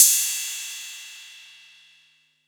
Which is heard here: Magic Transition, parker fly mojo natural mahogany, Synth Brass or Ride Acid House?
Ride Acid House